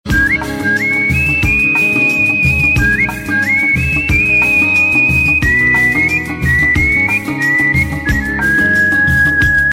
Nice Whistle

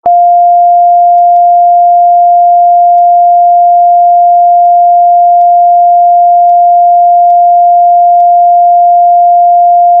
Television

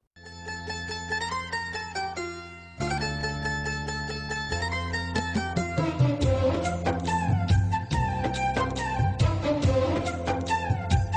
Flute Violine

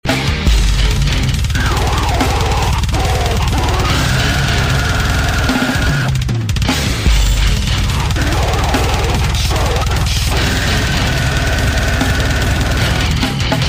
Crazy Drumming

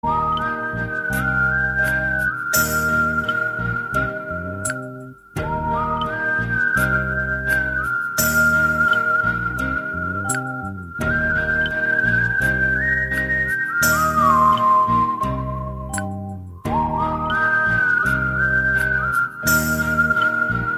Bangla Tone

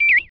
Beep

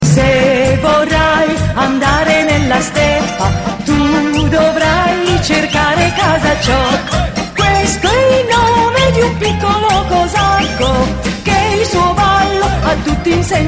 Ring Tones

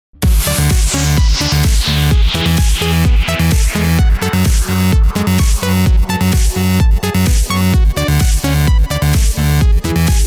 Mega Bass; X-killer

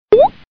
Water Drip Tone

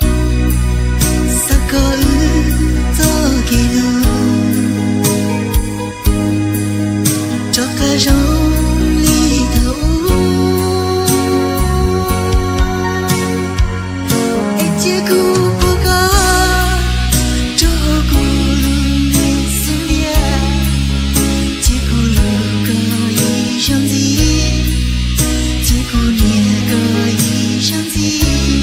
Hmong Love Song

